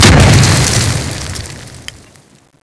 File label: explode